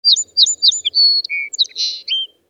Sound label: Amb Animals; Red Orchestra Ostfront 41-45